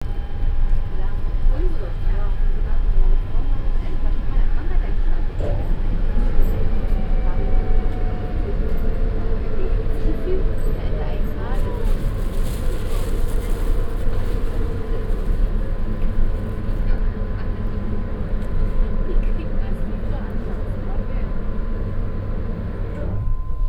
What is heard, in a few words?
U Bahn Berlin; Sound Scapes